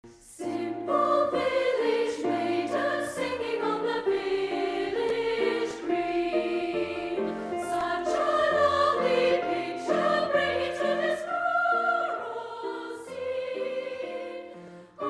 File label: Opening Song